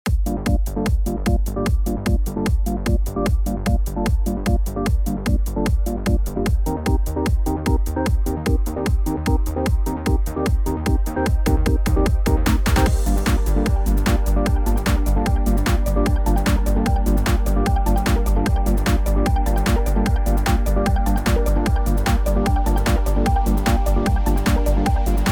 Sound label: Acid Party; auxy